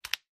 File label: Electric drill being turned on and off.; Tools, Hand Drill Motor, Drill